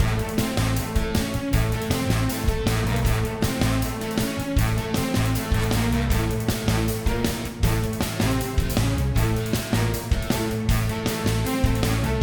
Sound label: Bee Hive Platforming MIXED; Ben 10; switch gamerip